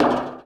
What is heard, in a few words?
bleacher step; Golden Crayon Sounds